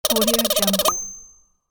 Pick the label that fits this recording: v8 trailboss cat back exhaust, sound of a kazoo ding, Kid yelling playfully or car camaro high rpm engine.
sound of a kazoo ding